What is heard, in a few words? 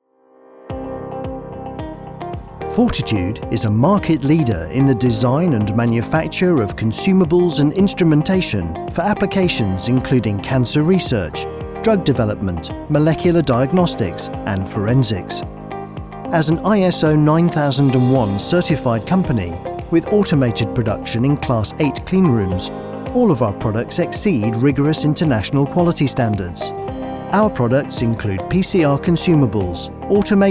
Corporate